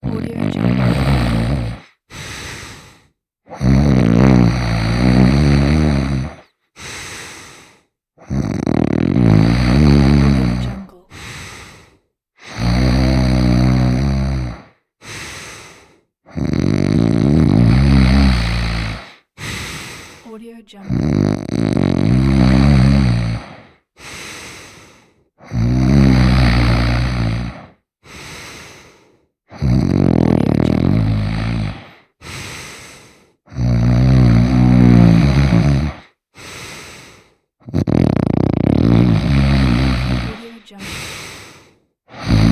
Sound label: the cartoon snoring